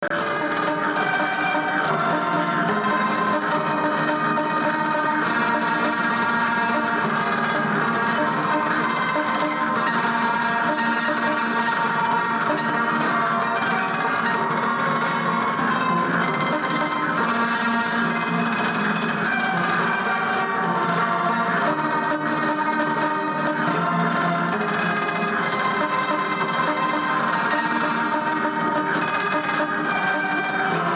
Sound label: unknown waltz